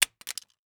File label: Household Knife Butcher Small Hitting Cement